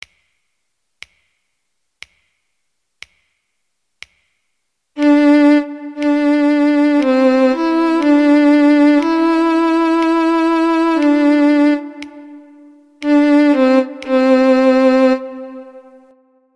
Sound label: Melodic Dictation 5 4